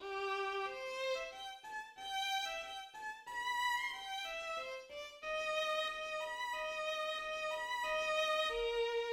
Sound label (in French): Le violon; Pierre et le Loup